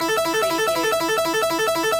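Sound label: Casino Slot Machine Harpsichord Loop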